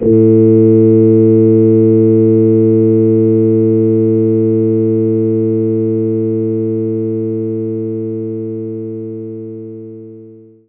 Hammond Organ

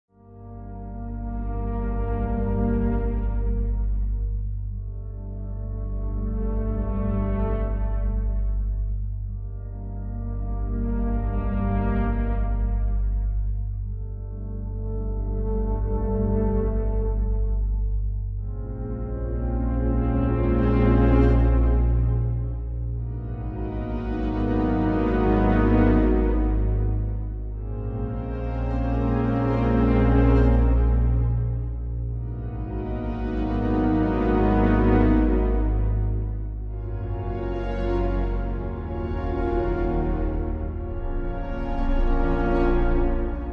music defeat; Taiker

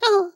grab vocal; Scrat

